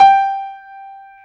PIA PRO, Roland

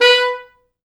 B3, Pop Brass; Best Service ProSamples vol.19; AKAI

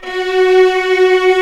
VIOLAS F#3

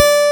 PICK E3, BassGuitar; AKAI S6000 CD-ROM - Volume 1

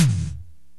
SDS8 TOM; Hollywood Edge; The Big Bad Beatmachine

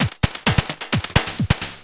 Beat, Industrial

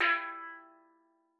E3, STEELDRUMS; Xsample VOL-4

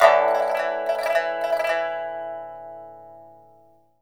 PIPA PHRASES; Spectrasonics; Heart of Asia

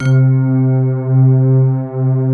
VIBRA STRNGS, pads; Pro-Rec - Synthsonic

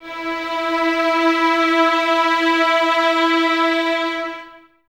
violins E 4, String Ensembles, 23 Violins; Miroslav Vitous

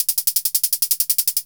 HH, Dark Side Of TripHop

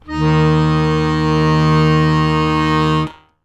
ACCORD BASS D#1

